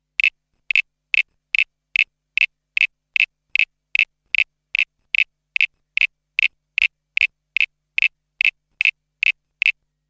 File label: Mistery frog